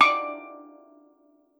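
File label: STEEL DR, Steel Drum Lead Pan, Pitched Percussion; E-MU Producer Series Vol. 7 – Old World Instruments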